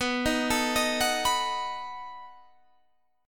B 7th B down

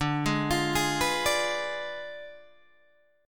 D Diminished 7th, D, down, Guitar Open G

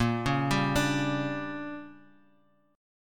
D Major 7th Suspended 4th Sharp 5th, chord

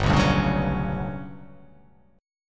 G Major Sharp 11th G down, chord; strummed; JGuitar

